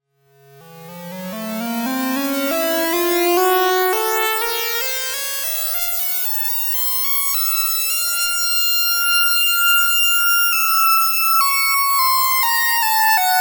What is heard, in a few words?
ring